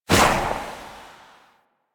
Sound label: Pistol Shot; Warframe; Tenno; Priest; Weapons